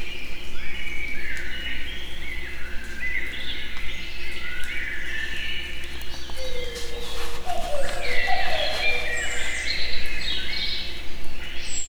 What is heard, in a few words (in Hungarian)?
érdekes hang, kakukk; Egerturistaház